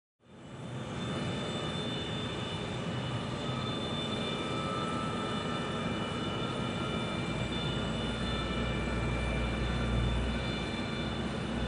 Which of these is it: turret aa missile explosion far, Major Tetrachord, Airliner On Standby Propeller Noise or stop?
Airliner On Standby Propeller Noise